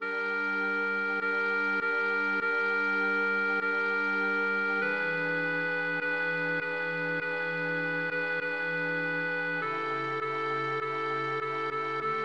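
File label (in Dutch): soprano, koor; A.Vivaldi; Magnificat; 9.Glordia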